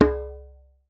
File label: African set percussion; Voice Crystal - Steve Reid Definitive Percussion